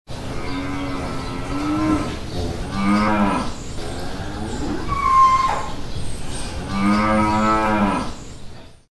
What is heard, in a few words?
cow barn